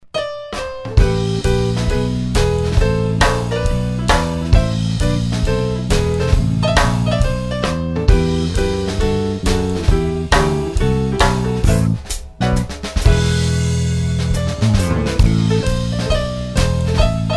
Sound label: Jazz Mysterious Ride